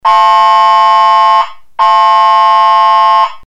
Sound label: AIRHORN